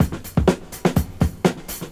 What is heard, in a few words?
D3 BREAK